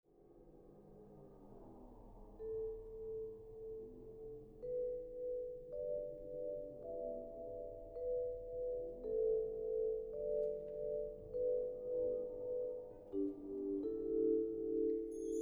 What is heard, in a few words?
Fantasy for Wind Ensemble